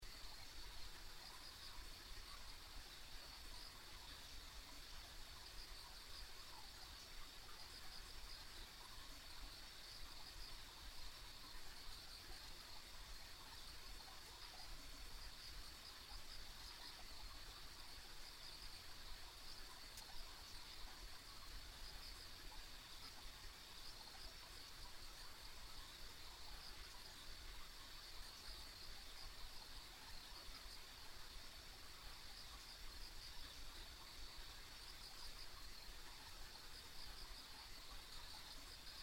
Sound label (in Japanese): 田舎道の虫の環境音